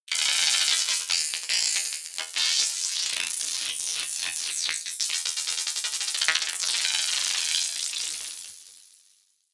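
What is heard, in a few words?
scuttle, science fiction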